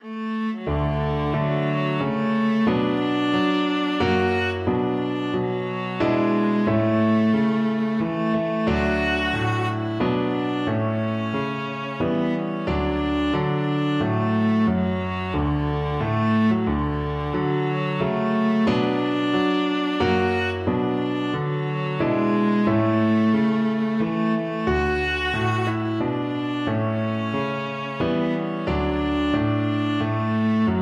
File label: star spangled banner, viola